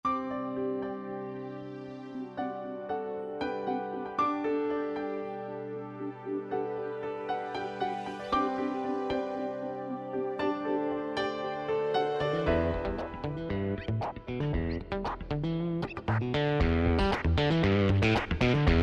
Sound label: Pop & Rock, Yamaha PSR-3000 Factory Demos